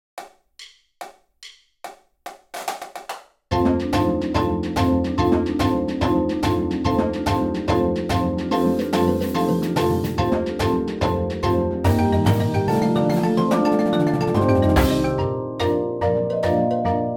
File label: percussion